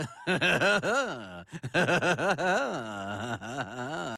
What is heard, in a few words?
funny laugh